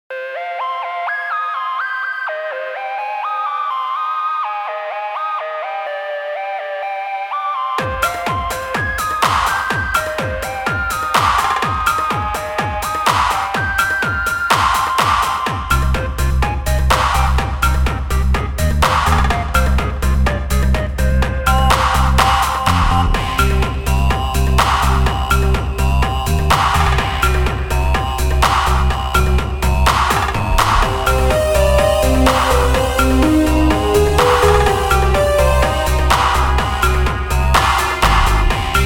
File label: electro club; vintage collection vgm sfx tracks stuff vol i